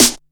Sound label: snare, Disco House